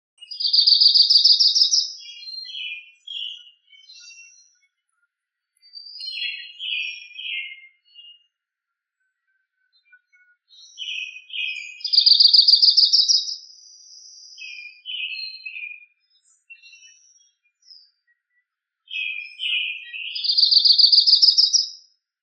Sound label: Yellow Rumped Warbler American Robin; Istvan B Orosi